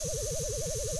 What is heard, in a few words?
PEACE WALK; HOBOCUTTER; Mob